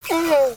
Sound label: Pet Tortoise Moan; creatures pets